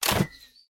weapon; Lunar Eclipse

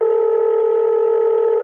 ring; kpd call in process

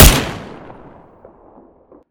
weapon; AEK-999

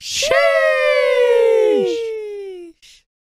cheer; spin high rarity cheer / sheesh

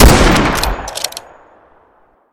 weapon; The new king

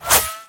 whizz

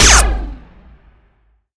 weapon; Cupid's Blaster

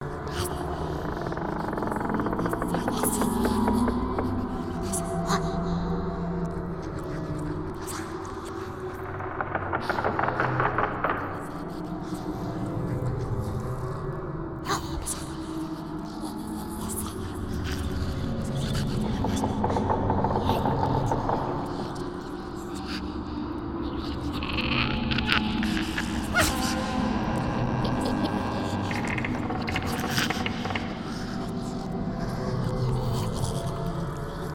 ambient

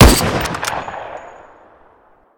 weapon; Intervention; Sniper Rifle

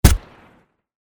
weapon; Bizon

